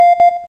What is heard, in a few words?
Timer; LG GS500 Cookie Plus